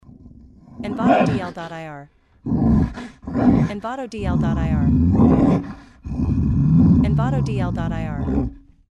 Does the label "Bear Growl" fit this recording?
Yes